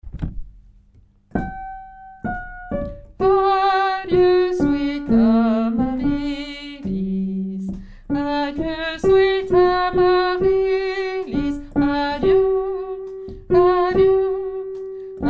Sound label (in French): basse, chorale; sweet amarillis; repetition agen septembre 2024